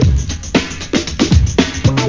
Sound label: breakbeat; World of Sound; instruments; beats